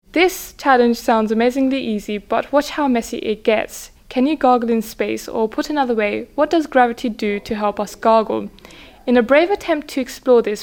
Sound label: Gargling in Space